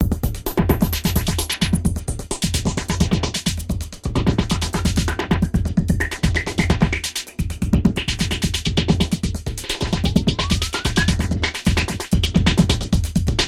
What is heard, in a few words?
drums